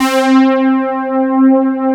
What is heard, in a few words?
JX-10P; USB Soundscan vol.49; Analog Fantasies; AKAI